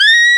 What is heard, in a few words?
SAX XSAX, Sax Sect.; Saxophones